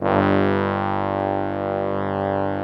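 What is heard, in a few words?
BRASS G1 left, ORCH BRASS; Greytsounds VOL-1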